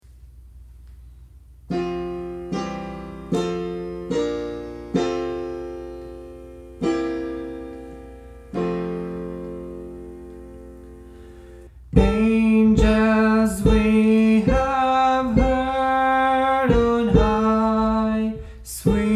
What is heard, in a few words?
Soprano